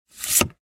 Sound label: card